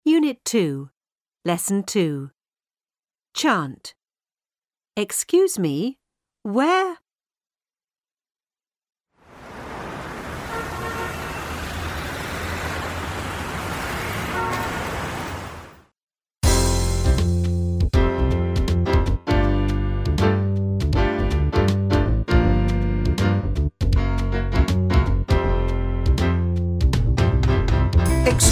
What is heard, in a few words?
Chant Excuse me; Unit 2; Lesson 2; Exercise 1